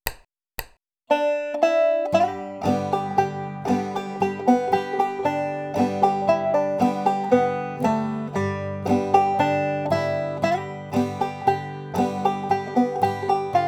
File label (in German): hammer on pull off slide und drop thumbing; john henry